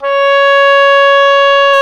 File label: OBOE, Orchestral Winds, Oboe mf f